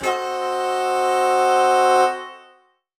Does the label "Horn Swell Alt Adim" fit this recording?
Yes